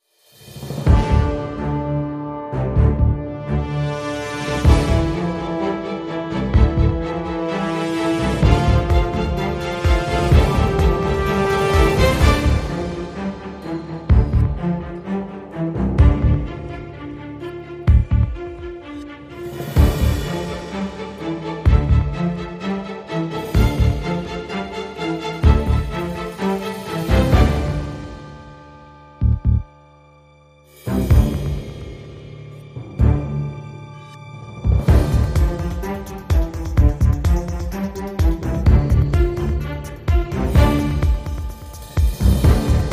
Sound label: Reduced Keys & Electro